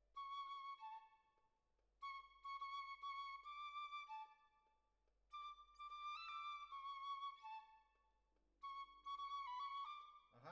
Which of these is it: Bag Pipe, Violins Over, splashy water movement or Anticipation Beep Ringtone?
Violins Over